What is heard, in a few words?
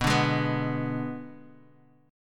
G sharp Diminished B